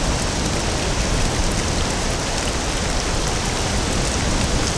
raining

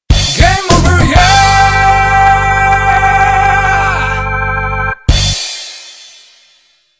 death

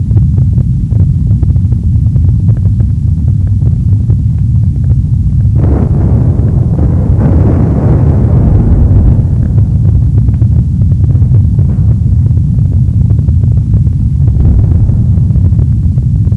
rumble flames explosions

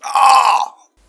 pain; doctor

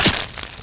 switchblade hit body

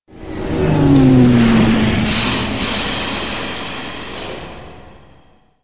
ship land

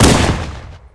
fire pistol, weapons; halo pistol

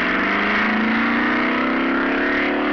hummer move

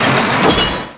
walk

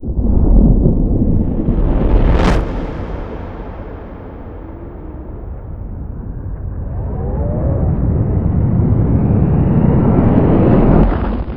credits roll

